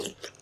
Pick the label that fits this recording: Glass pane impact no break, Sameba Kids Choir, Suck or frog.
Suck